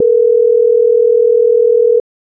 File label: ringback; PBXNSIP